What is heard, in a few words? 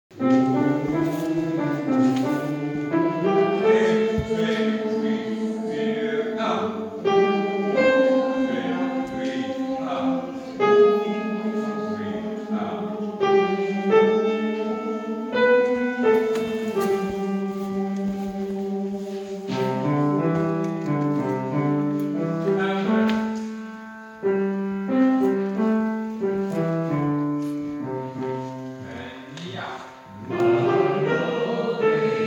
The Sally Garden Tenor